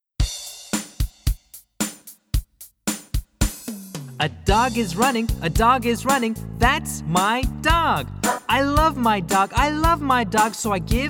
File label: chants